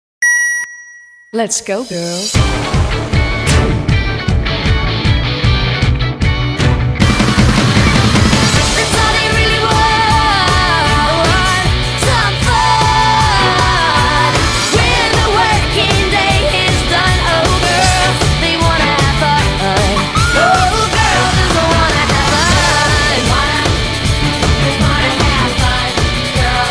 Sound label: Primary 155bpm Girls Mix